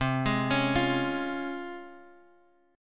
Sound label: Db Major C; arpeggiated